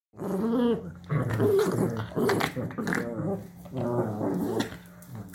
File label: dogs playing